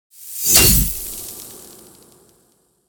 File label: Ice spell designed impact